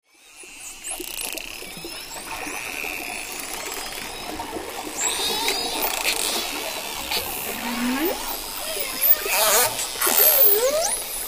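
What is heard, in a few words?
clicking and whistling of dolphins, water splashes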